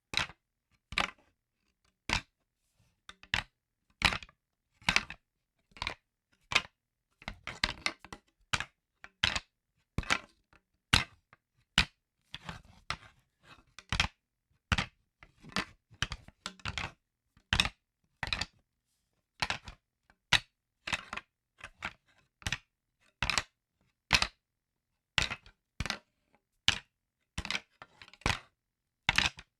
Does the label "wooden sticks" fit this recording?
Yes